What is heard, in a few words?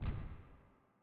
small Migration Step; HeavenStudio; flock Step